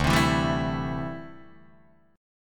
D 9th Suspended 4th D down Guitar Open D

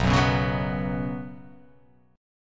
B Suspended 2nd chord; strummed; JGuitar

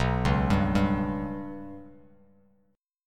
B Suspended 2nd Sharp 5th down Bass Standard; Bsus2#5 arpeggiated; JGuitar

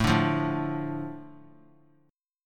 A Suspended 4th Sharp 5th; Asus4#5 strummed; JGuitar

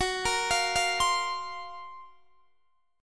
Gb Major Sharp 11th, Guitar DADGAD; GbM#11 arpeggiated

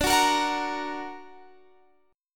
D Augmented, D, down; D+ strummed

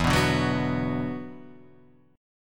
E 7th Suspended 2nd, down

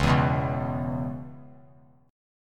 Db Minor 7th Db down, chord; strummed; JGuitar

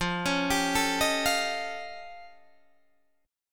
F Augmented 9th F Guitar Open D, chord

